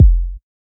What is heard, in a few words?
kick; ENZO Drum Signature Bundle Vol.1